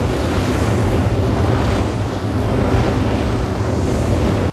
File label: Fire Loop; Power Valyrian